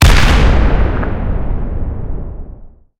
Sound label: tanks explosion; War Thunder